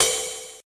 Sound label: drumset, drums